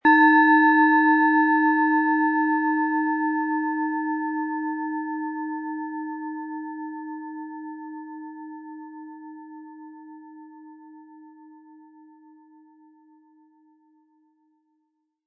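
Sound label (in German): Planetenschale Eros inkl. Klöppel; Eros, Ø 11 cm, 100-180 Gramm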